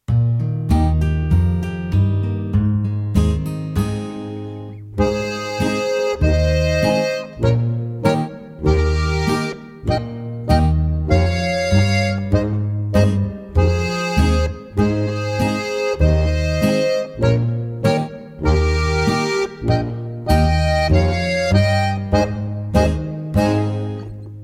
ennstaler polka 3 knopfreihe; Knöpferl Geschichten 1

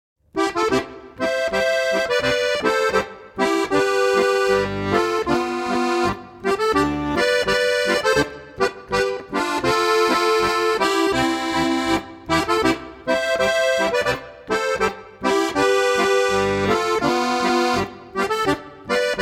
Boarischer

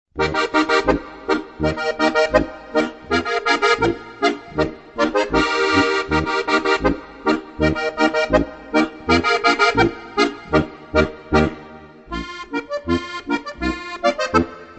boarischer

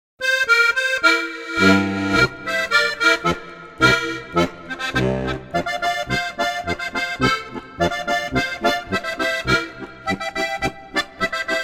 harmonika echo

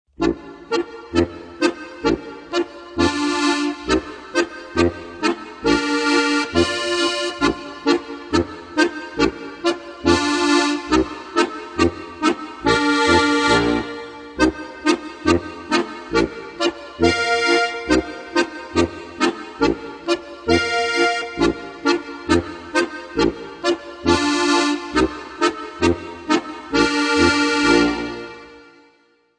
boarischer

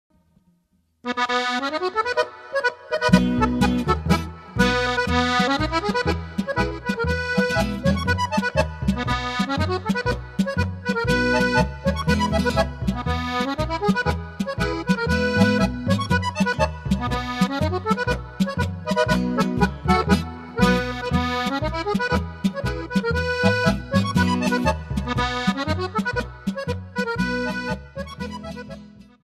polka

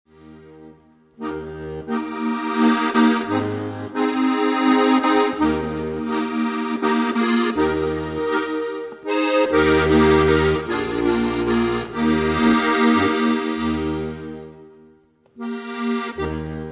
typisch goiserisch

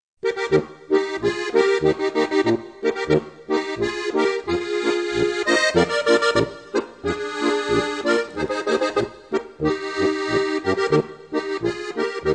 Boarischer

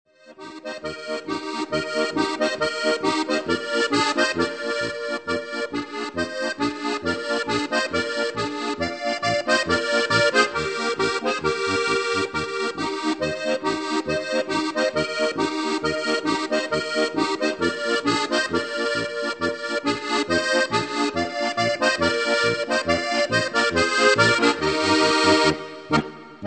polka

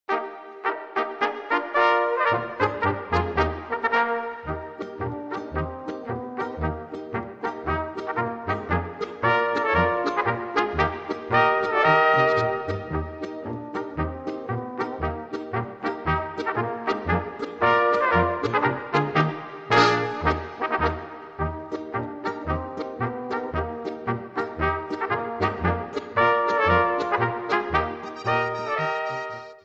polka

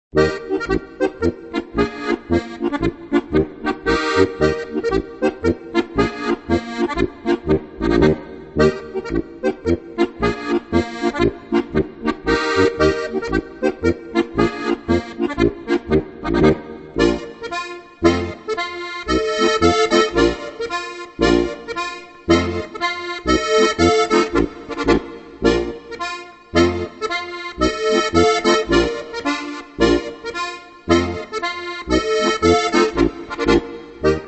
polka

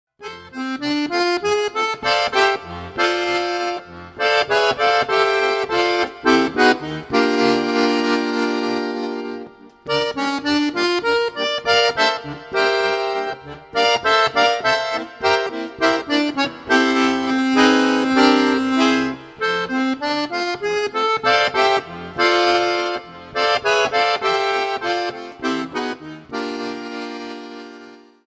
swingende harmonika solo

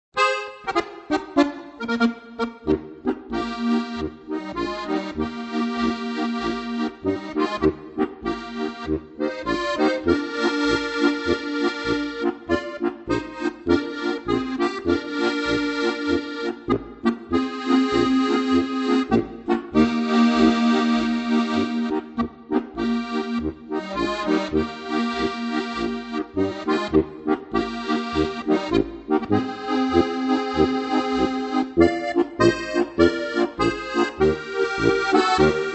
Polka

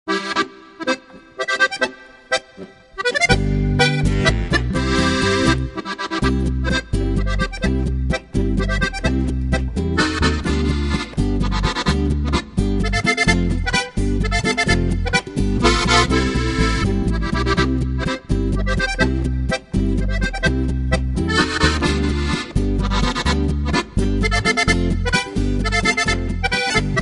walzer

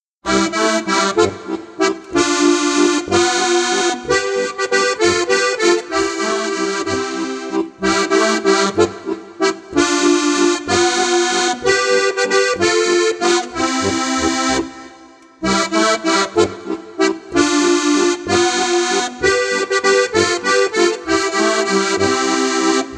walzer

